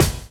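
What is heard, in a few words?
drum chop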